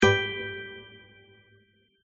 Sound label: Combo; gardenbloom